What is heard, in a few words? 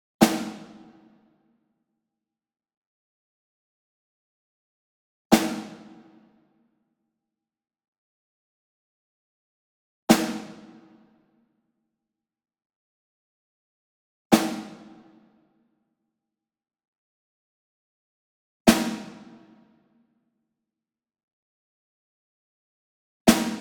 SNARE Jarrah Block Hit Medium; SINGLE; Brady; boom library; Cinematic Strikes Construction Kit